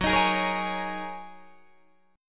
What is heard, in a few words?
Gb 6th Add 9th Gb down Guitar Open D